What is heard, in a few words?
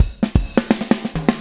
SWNG, SWNG SHFT